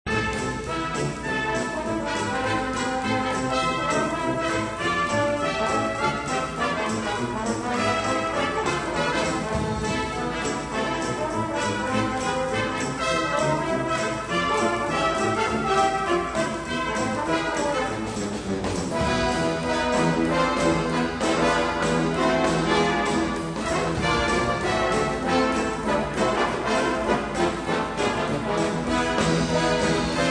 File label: three kings swing